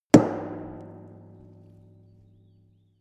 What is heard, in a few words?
metal impact tank solid